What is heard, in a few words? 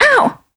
Character Hit